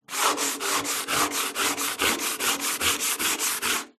industrial metal drum cleaning scraping sanding grind